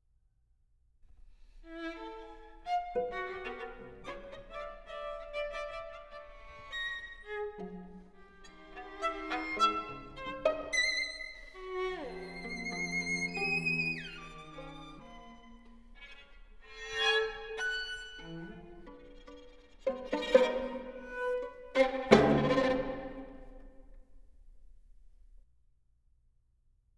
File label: String Quartet, Leicht bewegt; Six Bagatelles for String Quartet, op. 9